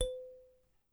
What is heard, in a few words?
ding; abstract; Free Sound Assets